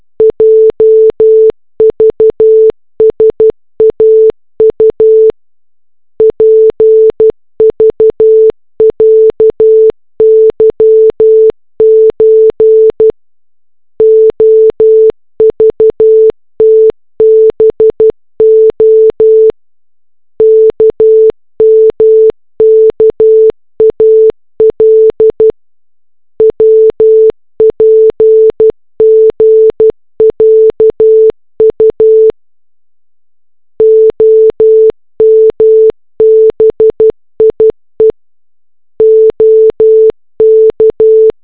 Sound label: morse